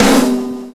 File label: Adrenal Snare; Sonic Foundry (Sony Creative Software); Drums & Drones; Construction Kits